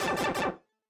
Power Down Fast; SMBW

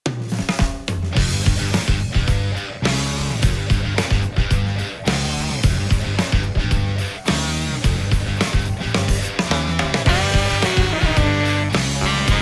Underground BGM Arrangement; SML

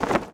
Goal Pole Flag Change; SM3DL

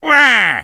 Hurt; Wario; Mario Party 8

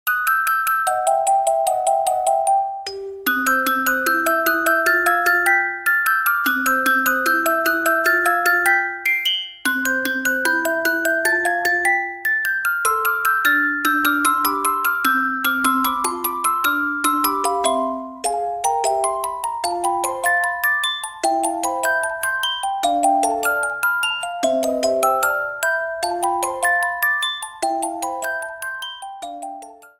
Sky Night; SMM2; SMB3

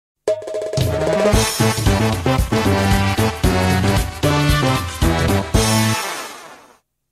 Bang Out a Drum

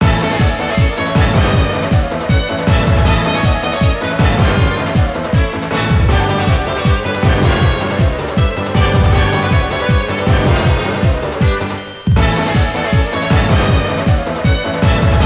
Music; Fawful

